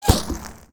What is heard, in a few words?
impact Sweetener; Weapon Package FortWars